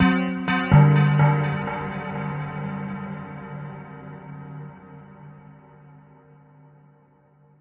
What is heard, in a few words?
bell echoes, synth and electronic loops